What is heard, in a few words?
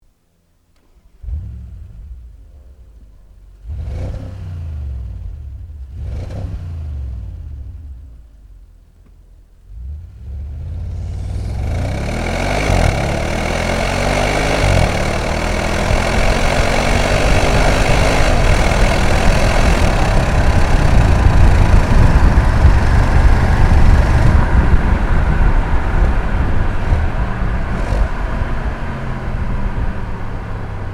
Audi S6